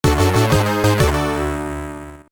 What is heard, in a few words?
Scene Complete; apidya; amiga; Sewer Blast